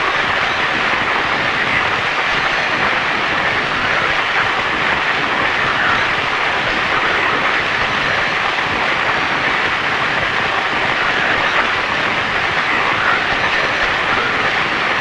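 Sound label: Ambient Static Loop; silent hill homecoming 2008 gamerip pc ps3 x360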